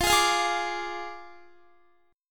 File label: F Minor 6th F down Guitar; strummed; tuning 4F,4A,5C,5G,5C,6E